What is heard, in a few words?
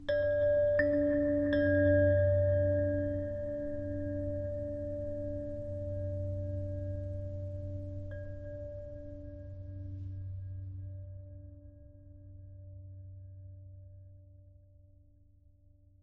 ambient abstract chimes low; Half Life Model Pack